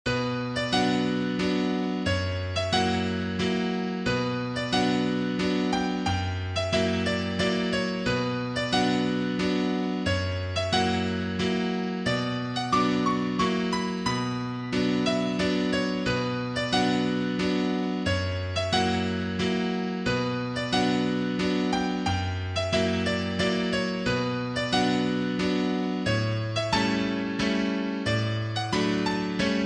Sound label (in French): Valse en Si mineur; Schubert